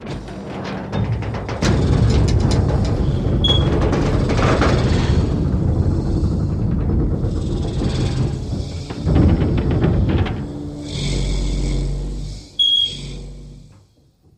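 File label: Mechanical Movement Eerie, sliding door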